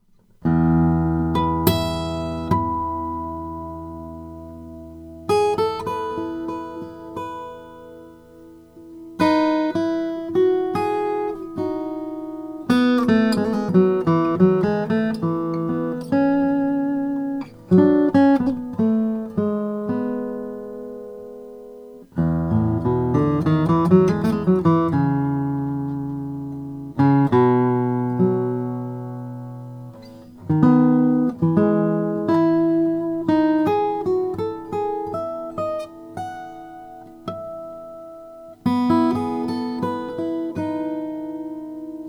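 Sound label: kraut 00 brazilian rosewood, guitars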